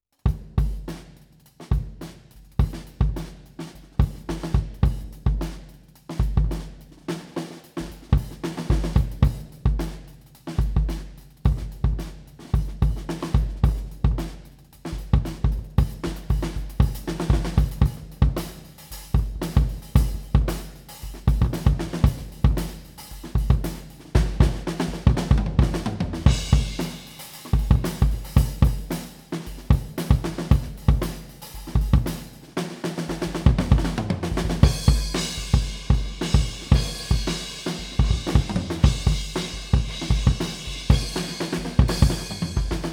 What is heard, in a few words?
Kick Shoot U47FET